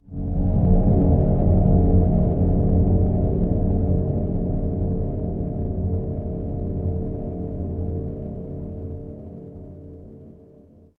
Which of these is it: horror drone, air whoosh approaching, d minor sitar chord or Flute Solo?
horror drone